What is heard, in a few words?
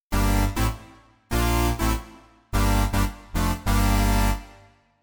Emu Modular